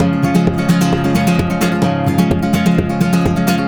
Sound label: 130 C# Rumba; Spanish And Gypsy Traditions; Soundscan vol.59; AKAI